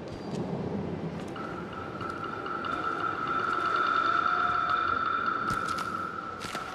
bark

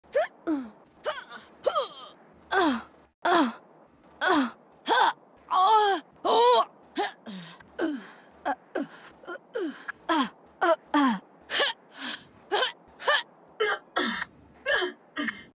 all female death sounds; Valorant

